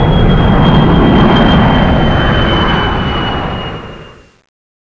training mouseship takeoff; gearbox; gunman

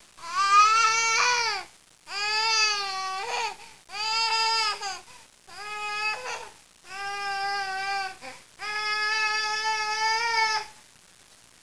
crying loop

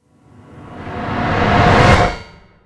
farm weird; svencoop; keenhalloween

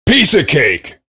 cheer; svencoop; voice commands; SeriousSam

